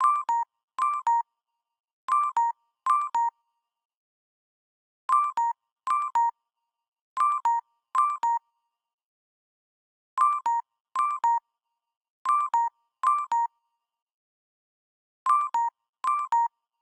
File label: Hello, ringtone; LG; G4